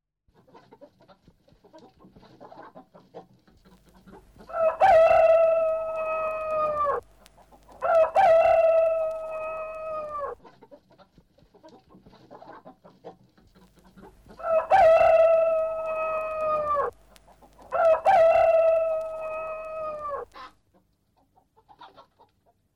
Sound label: cock and hens; SOUND EFFECTS1